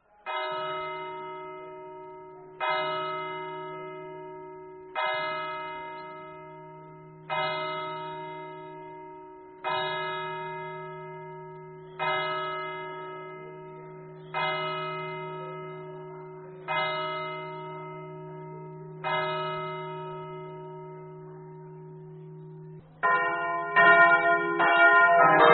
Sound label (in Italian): variano, campane